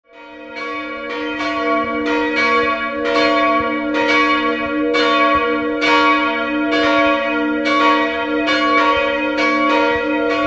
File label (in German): Echendorf, Glocken